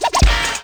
success; Matt Hoffman's Pro BMX 2; XBOX

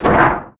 Foot Duct, Footsteps

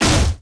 bodyfall, droids robots; artoo

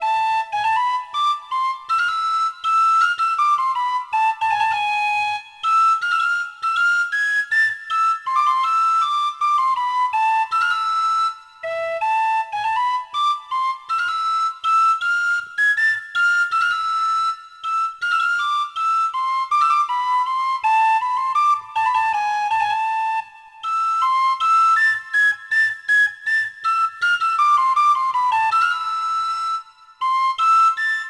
town merchant; Sid Meiers Pirates; XBOX

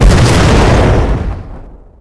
Small Exp, Explosions

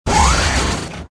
welder vert; movers objects

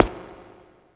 step church; people; Pirates Of The Caribbean

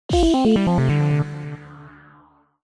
ball drain, Pinball; Pure Pinball

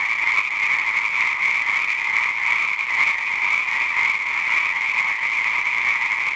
Frogs

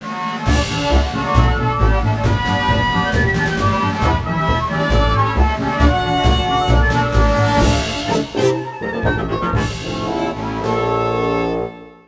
Vine Swing, Music

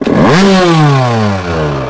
kick, YAMAHA

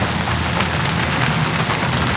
grass, Impact; Cars; XBOX

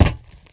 Foot Metal; Player; Footsteps

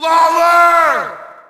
Shout, audience; UFC Tapout 2

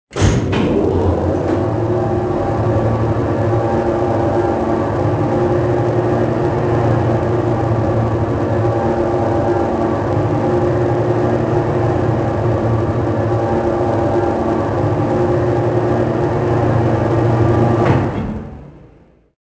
elevator run; Soldier Of Fortune II Double Helix; XBOX

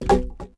club hit concrete; objects; Star Wars Obi-Wan; XBOX

